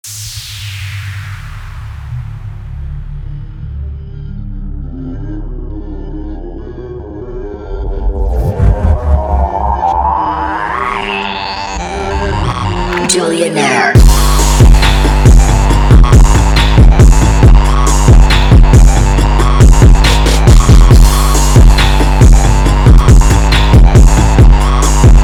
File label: Arabic Bass